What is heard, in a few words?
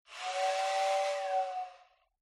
Toy Electric Train